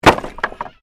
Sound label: Wood Movement Medium